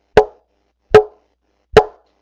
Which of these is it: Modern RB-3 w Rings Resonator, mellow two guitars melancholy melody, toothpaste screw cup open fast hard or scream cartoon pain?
Modern RB-3 w Rings Resonator